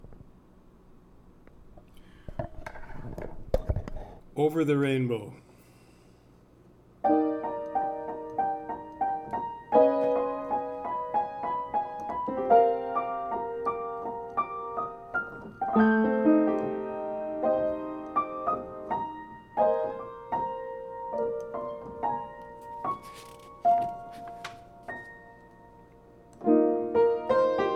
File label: bass, choir